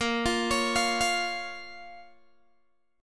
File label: A sharp Minor A sharp down Guitar Open G